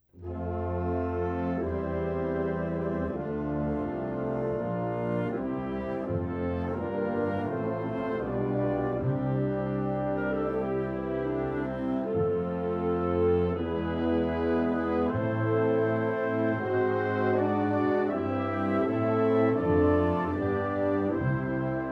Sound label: o canada instrumental